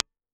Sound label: RCA 44BX, IR